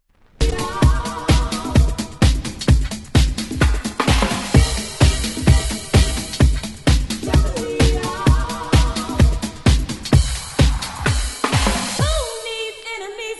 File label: disco